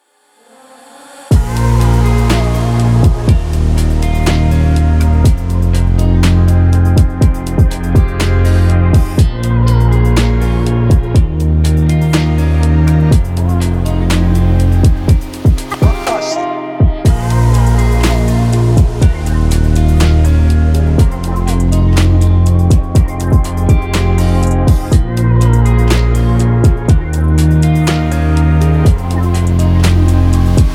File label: luxury chill